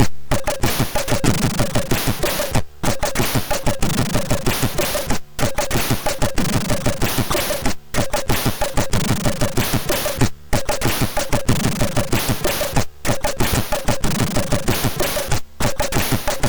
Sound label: Funk, MOS6581R2